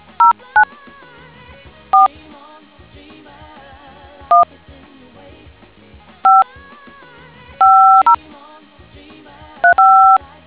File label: dtmf